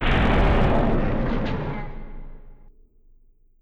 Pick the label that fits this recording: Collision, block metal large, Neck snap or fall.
Collision